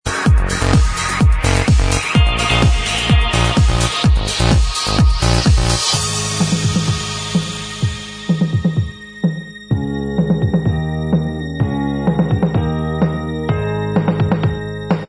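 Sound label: Instrumental Track, backing tracks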